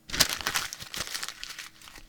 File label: Crumpled Up Paper